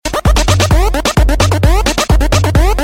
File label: sms new year beats; hip hop